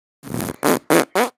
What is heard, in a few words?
door open